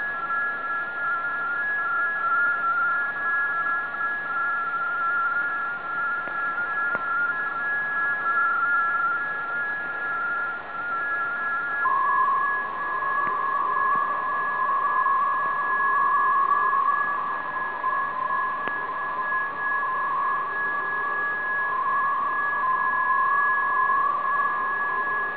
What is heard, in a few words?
ISR-VFT 75-150; complete VFT aggregate running 75Bd and changing over to 150 Bd; ISRAELI-INTEL VFT SYSTEM; MCVFT-systems